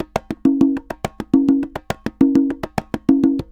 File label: Congas, Festa Latina, Drums & Percussion